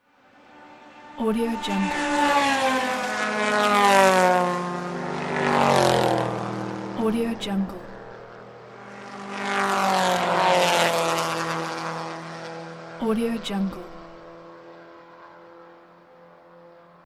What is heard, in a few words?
several racing cars driving by